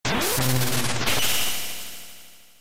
break spell; ff3; ff3us

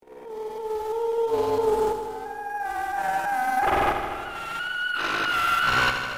ghost music; ff3us

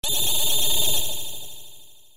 multiple ting; ff3; ff3us

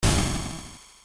monster death; ff3; ff3us

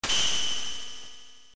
fight ting; ff3; ff3us

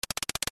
dice; ff3; ff3us

